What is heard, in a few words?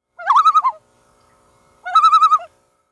loon tremelo